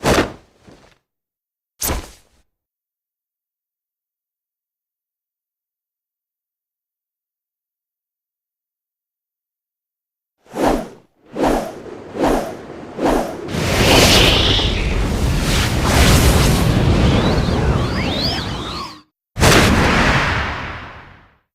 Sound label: transform; amara